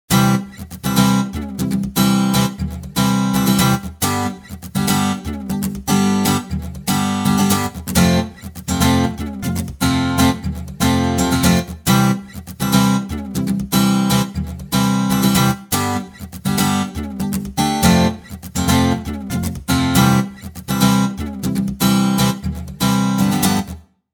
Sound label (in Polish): gitara fragment